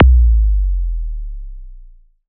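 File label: kick, ARP 2600 Drums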